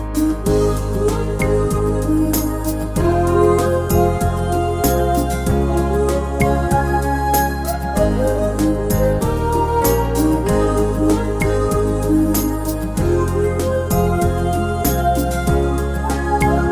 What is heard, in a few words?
Slow Singing